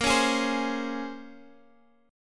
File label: Bb Minor 9th, Guitar, Open G; 20,18,18,17,x,x